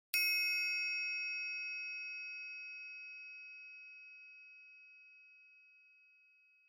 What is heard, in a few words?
Triangle Single Long